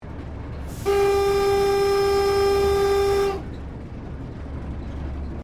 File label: Train Diesel TGR X Class 1951 Tasmanian Operating Cabin Whistle; Bellarine Tourist railway vehicles; Trains